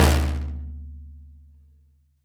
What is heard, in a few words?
BASS, HAND DRUMS; SoundBytes - Early Patches